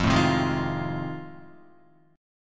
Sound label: F 7th Sharp 9th, Guitar; F7#9 strummed